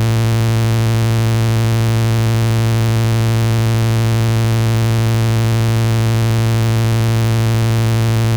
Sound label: Oscillator Left raw Right Korg MS20 filter